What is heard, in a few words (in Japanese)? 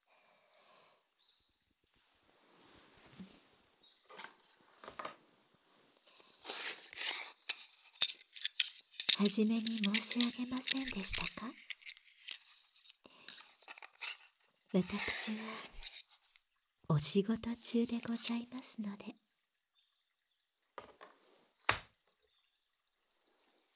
右の耳かき; 日向耳かき; 道草屋 はこべら-移動珈琲店; 昼の部-はこべら移動珈琲店